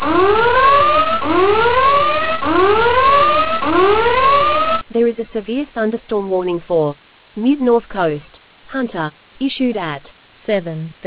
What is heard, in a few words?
storm audio